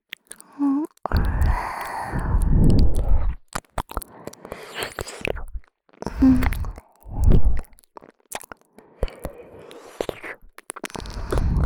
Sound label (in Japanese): レロレロ…（浅いトコを舐める）; ASMR; 右耳